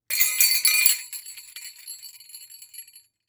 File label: bell jingle